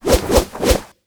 Tech Stand

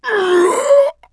Orc Die; Seer; GamigoNA

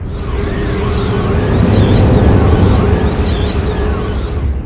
Gate Idle; Seer; GamigoNA

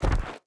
Mammoth Walk; Seer; GamigoNA

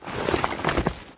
Item Sort

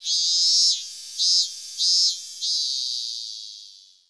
cicada; Seer; GamigoNA

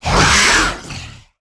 Lizard Man Die

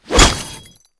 Were Boar atk

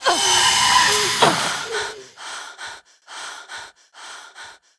die; Seer; GamigoNA

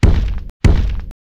Run

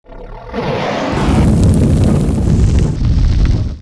Dragon Horse Special; Seer; GamigoNA

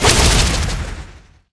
Multi Shot; Gamigo

